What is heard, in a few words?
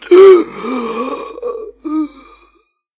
death; doctor